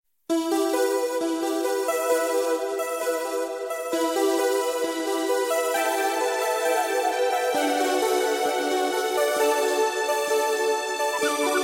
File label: Gentle Flute